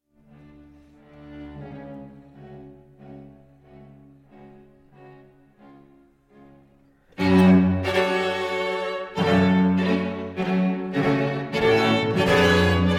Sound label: string quartet in G; belcea quartet; franz schubert; d 887